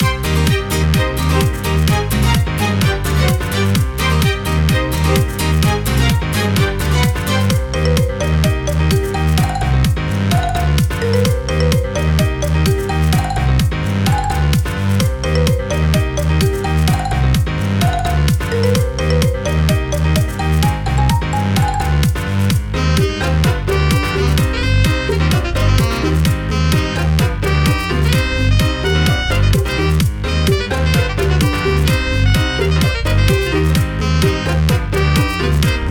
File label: Block Game (Mini Game)